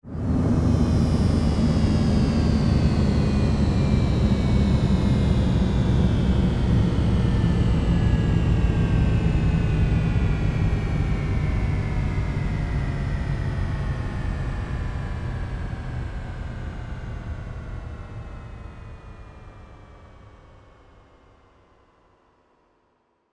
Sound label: spaceship engine descend power down; a dump in the dark